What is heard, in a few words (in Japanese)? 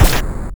攻撃：敵